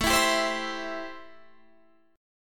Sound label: A-Minor 7th A; strummed; Am7